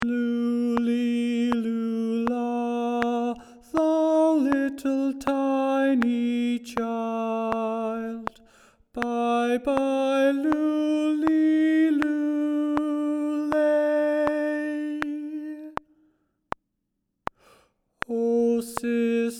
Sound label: Tenor